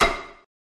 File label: Glassy Impact On Tv, television